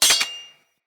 Dagger Impact Block; Weapon Impacts; Daggers; Combat

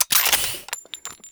Revolver Rifle Reload; Warframe; Weapons; Tenno

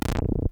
Corrupted Notes; Warframe; Bard Quest; Bard Boss Fight Notes

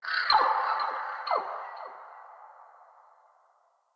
Swamp Mid Creature, Ambience; Midrath Island

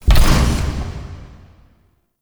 UI Mod Activate Turret Weapon; Warframe; Railjack; Player Ship

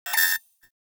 Rifle Firing Stopped Mech; Weapons; Warframe; Corpus; Ruban

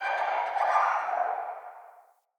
Day Distant Creature

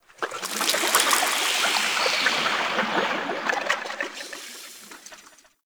Squelch Goop One Off; Props; Warframe; Tau; Ambience

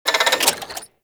Artillery Mech; Warframe; Nechro Mech; Abilities

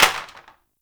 Plastic Drop

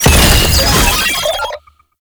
Sniper Drone Deploy; Warframe; Lasrian; Drones

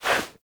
Speargun Throw Release Foley; Warframe; Weapons

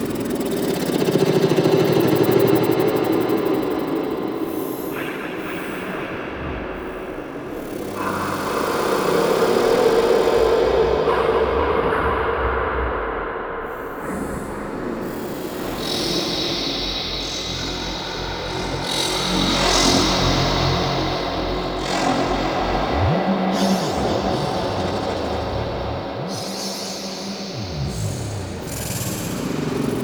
Exterior Amb; Warframe; Cephalon

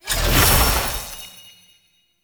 Team Buff Cast; Enemy; Sinecure; Melee Grunt Dual Agent; Abilities

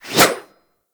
Axe Small Swing; Warframe; Weapons; Swings

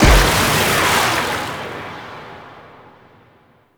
Cannon Blast; Warframe

